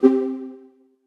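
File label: SFX cancel; misao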